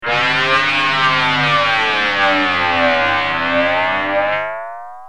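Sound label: guitar, flanger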